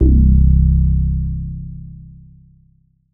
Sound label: Jupiter-8 BASS Reso - C; Synth; One Shots; Cymatics; Fantasy Synth Sample Pack